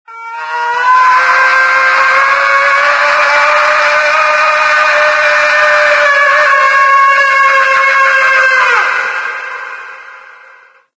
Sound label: speaker scream; Source Engine; de deadblock